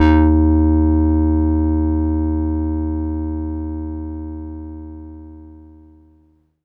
Octaver d#3, Korg Poly-800

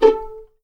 A4 PIZ MF, VLNS PIZZ; East West; The Ultimate String Collection

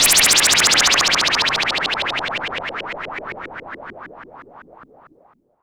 FX, Moog Minimoog

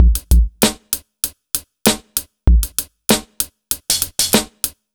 97 BEAT, Pure Electro; USB Soundscan vol.03; AKAI

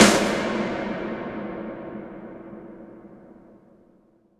IMP SNR DRUM, Drum, Cinematic Impact

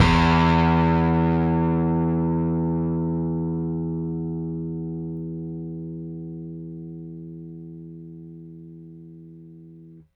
Rickenbacker Bass; Airwindows

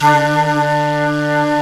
Flute, Singin' Flute

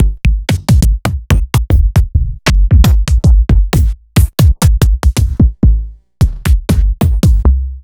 Sub Perc

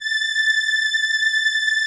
VP-330, String Machines

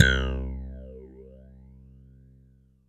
JV phase, JV-1080; Syntec; Wall of Sounds

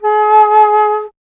Alto Flute, Solo Instruments

